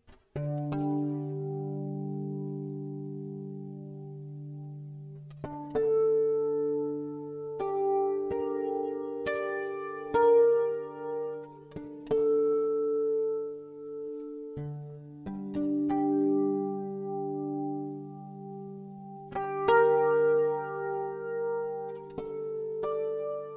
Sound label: Gm 95 strings keys